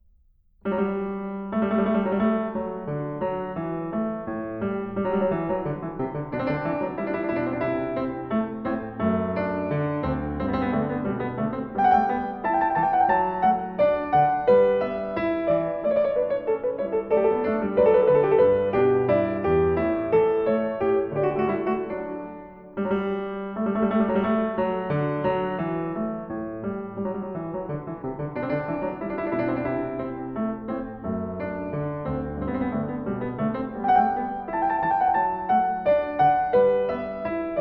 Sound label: Variations, for keyboard